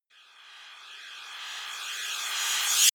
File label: rise hiss